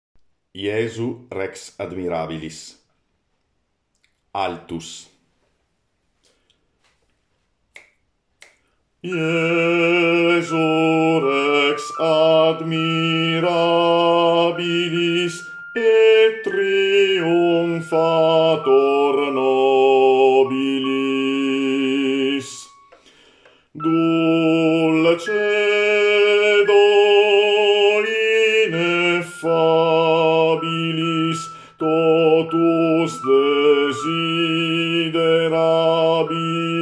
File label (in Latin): Altus; JESU REX ADMIRABILIS